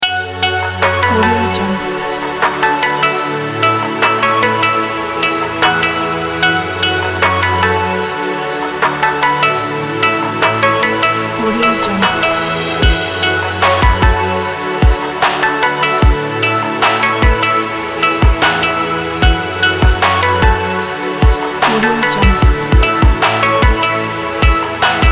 tropical pack; garybirdman

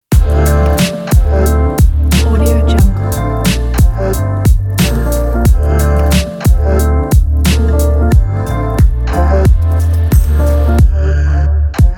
the hiphop logo; raspberrymusic

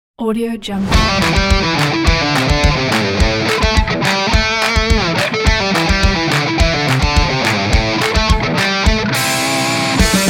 rock optimistic

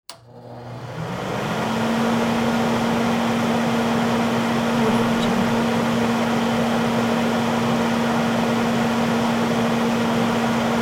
stove vent fan high and low speeds

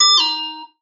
mm notification; prosoundfx

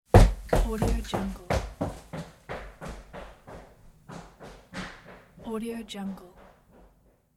footsteps running away on wooden floor

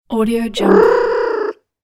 cat purr

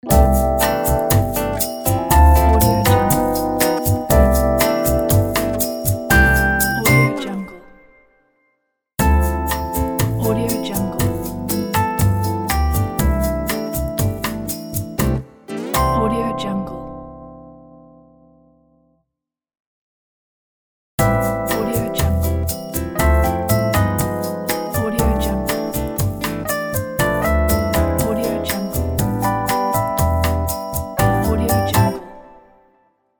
bossa nova logo

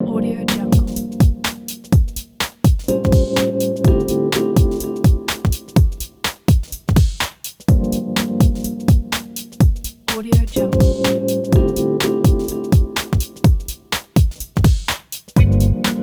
lounge bar

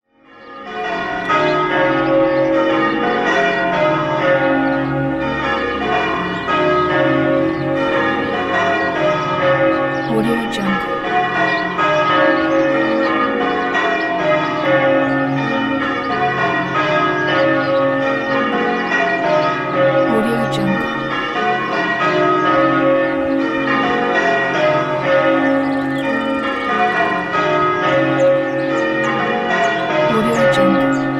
church bells ringing at wedding; soundsvisual

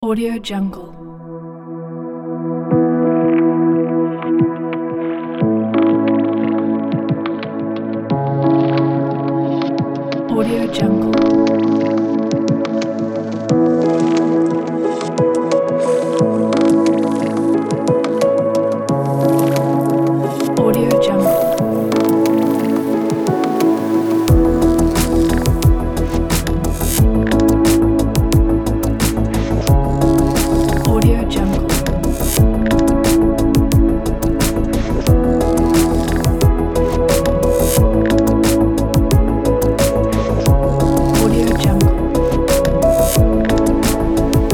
simple piano glitch

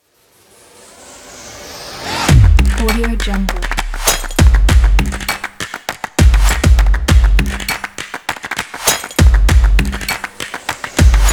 percussion

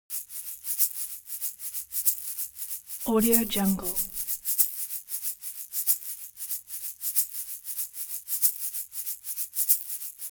eggshaker slow long; musical elements

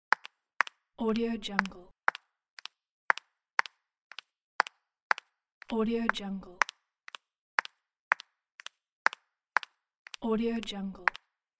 female in heels walking on linoleum

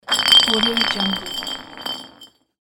glass bottle spin; kazoomx

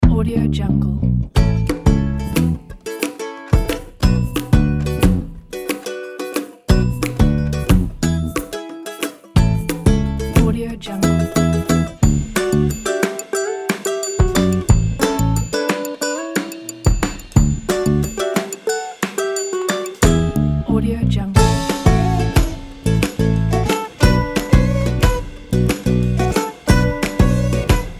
ukulele happy vibe; audiopop

